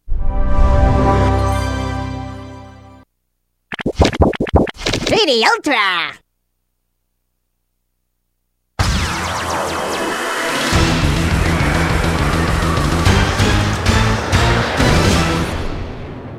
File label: Intro, pinball music; 3D Ultra Pinball Thrillride; Sierra 3D Ultra Thrillride